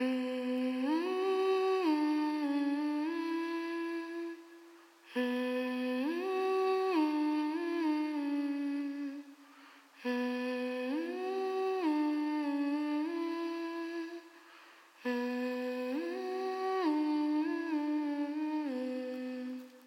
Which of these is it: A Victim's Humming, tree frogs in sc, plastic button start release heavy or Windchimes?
A Victim's Humming